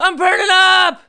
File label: BURN; Messiah